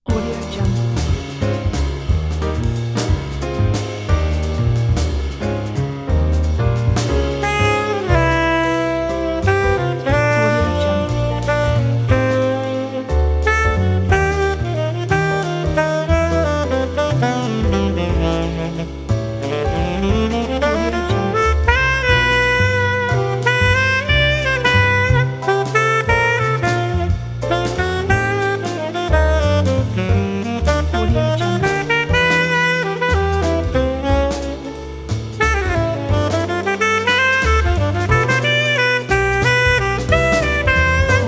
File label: cool jazz waltz